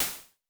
Snare Hats, Vermona Mono15 Drums

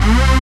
SYN TRECKNIC, Dance Synth; Sound & Vision - Gigapack I CD 2 (Roland)

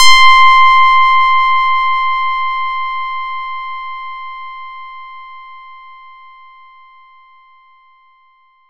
FX RINGR; ModelCycles

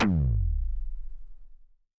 Low Tom, Yamaha PTX8

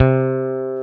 Basses, Roland

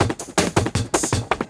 LOOP, 160 BPM, Drum & Bass

